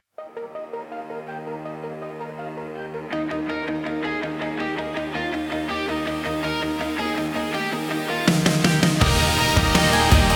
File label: Electric Thunder